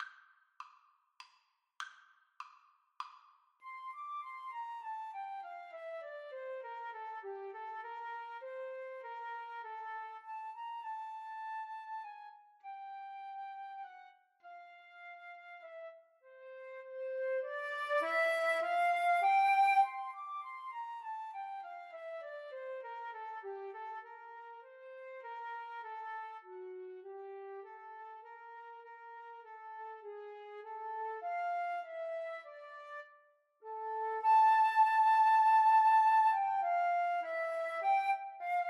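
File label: flute duet karaoke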